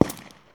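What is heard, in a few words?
rifle exterior shot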